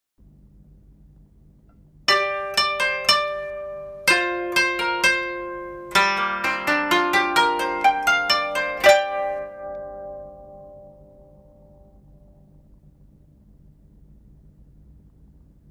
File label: koto